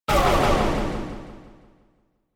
radio impact hit delay; overte; partyBall

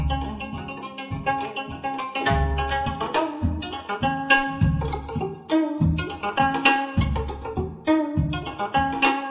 gayageum; Korea; Instruments

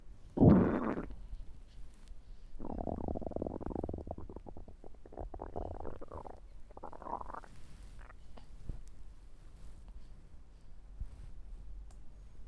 baby poop

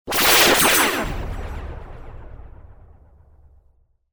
magic laser rays spell; sfx; overte; partyBall

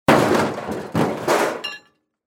impact car hood crash; sfx; overte; partyBall

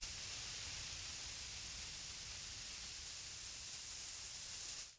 spray paint

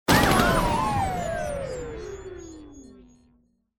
radio impact power down; sfx; overte; partyBall

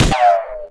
machinegun ric; weapons

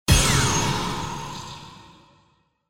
radio impact blast electronic; overte; partyBall

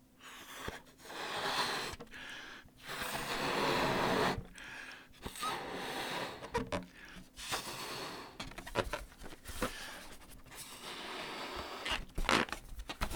balloon

